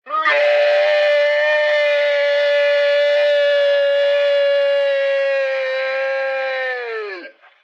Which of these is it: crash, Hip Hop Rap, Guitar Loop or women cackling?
crash